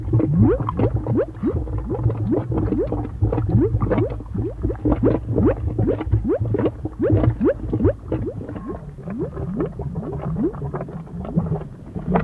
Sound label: Comedy Volcanoes; cupcakeLand